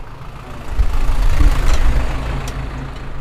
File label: old truck passing